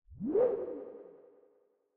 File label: woosh